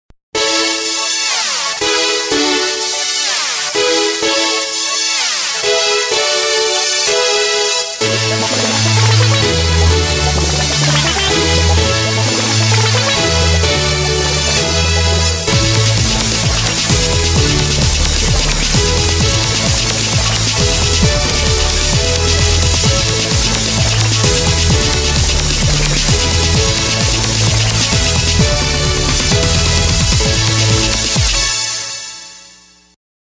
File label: electro